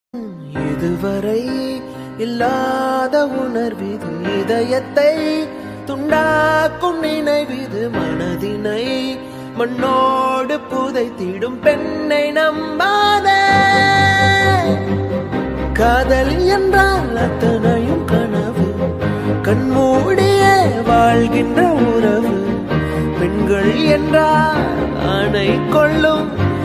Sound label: love bgm ringtone tamil bgm ringtone